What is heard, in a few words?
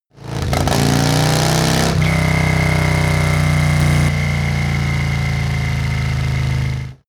motorcycle harley accelerate decelerate whistle